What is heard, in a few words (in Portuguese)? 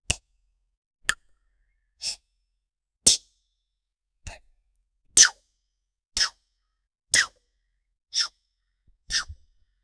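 beijo de língua, romance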